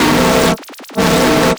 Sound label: CYBER MONST, CYBER TOYS; Spectrasonic Distorted Reality 1